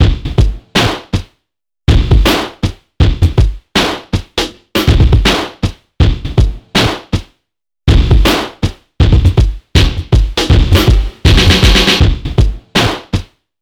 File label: HIPHOP; INIS; Funky Xtreams